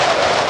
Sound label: Sfx Winter games